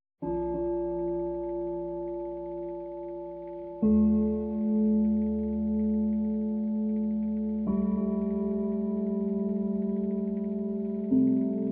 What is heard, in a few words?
Chimes; Quiet Rooms & Other Worlds